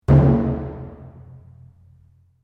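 Drum Taiko Odaiko forte, Large Japanese Taiko Drum; Miscellaneous Musical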